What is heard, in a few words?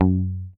HOFNER MUTE, Bass